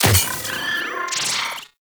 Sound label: Drone Death Malfunction